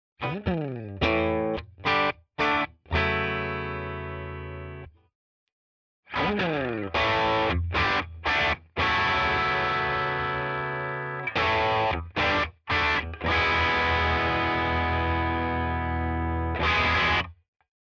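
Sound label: Walrus Fundamental DRIVE Bright, guitar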